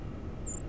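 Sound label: White-throated Sparrow, nocturnal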